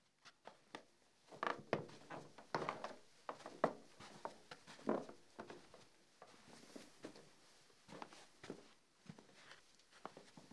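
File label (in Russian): Крадутся на цыпочках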